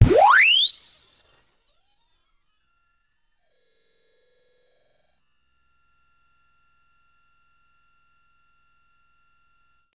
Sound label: a bright high pitched las; laser